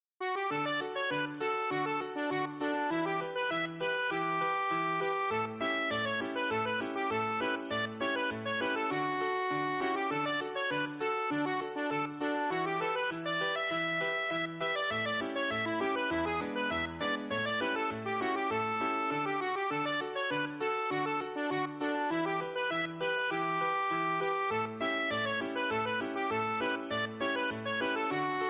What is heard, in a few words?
cakewalk